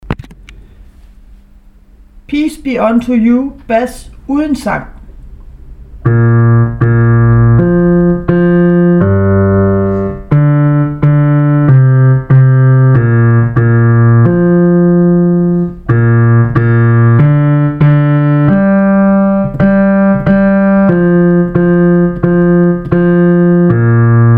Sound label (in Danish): bas uden sang; peace be onto you